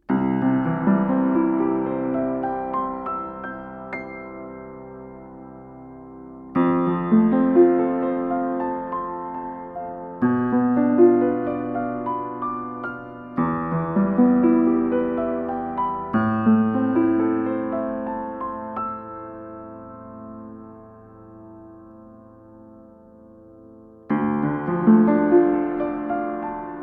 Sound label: DPA 6060R 4060L Piano Top